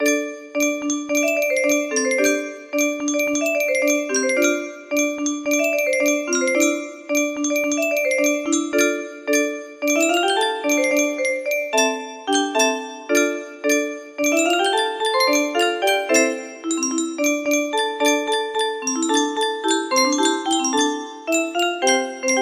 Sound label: 15 note, music box melody